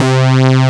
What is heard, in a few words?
SAW,sound canvas